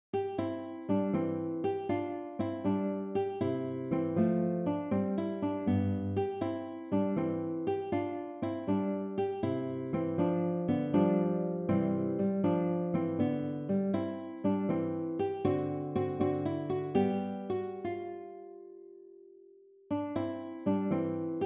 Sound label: Allegro, konzertgitarre; Carulli